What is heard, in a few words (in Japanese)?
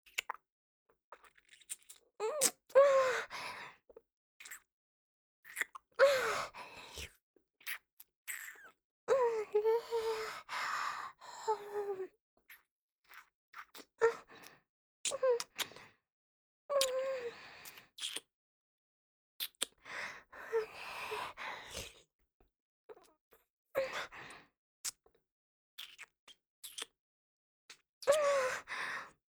kiss